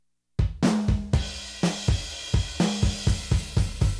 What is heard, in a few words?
drums; musdb18